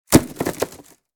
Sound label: Hamper drop floor impact; basket